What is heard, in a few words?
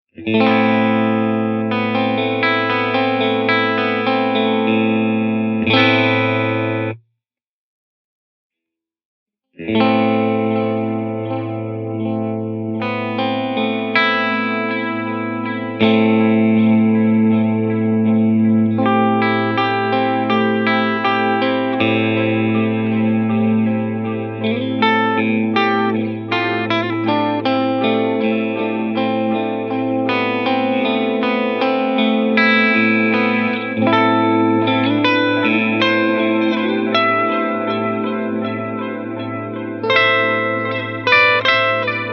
Digital Pristine, echosystem